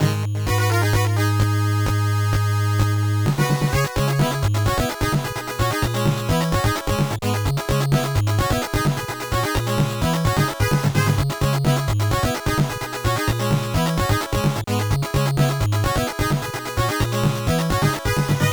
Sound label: Stage BGM; final mission 1990 nes gamerip; Mono~Stereo